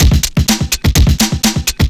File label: drum loops; bb drum loops